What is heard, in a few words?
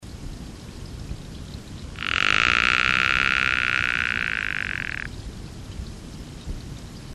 Mantidactylus betsileanus